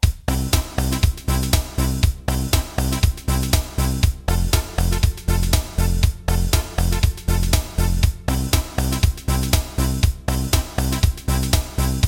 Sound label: House